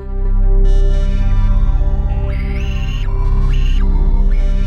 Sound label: WS DA C 1-L, Korg Wavestation; Back In Time Records; Korg Universe VOL-1